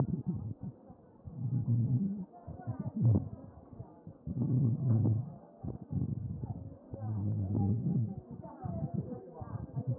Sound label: A CHEST